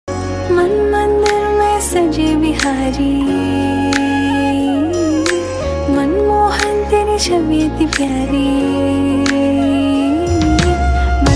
shri krishna govind hare murari cover song female voice